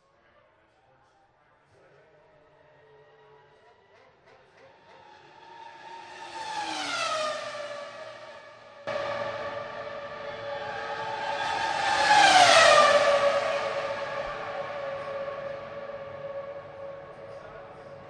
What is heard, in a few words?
F1 indy speedway; Sound FX Real Life